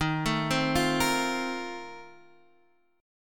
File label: F 11th D sharp, chord